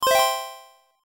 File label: menu sfx unused